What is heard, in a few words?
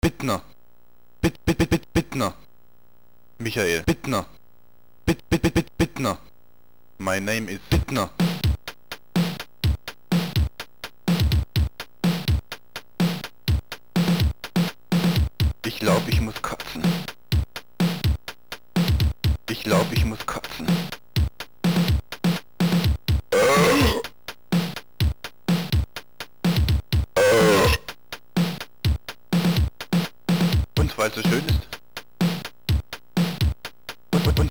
Rap; Mad Max